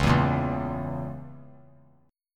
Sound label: C sharp Minor 6th; C#m6; strummed; JGuitar